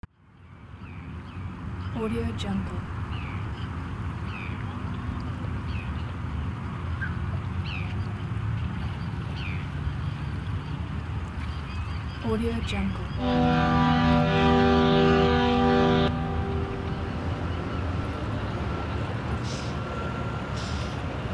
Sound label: sea port ambience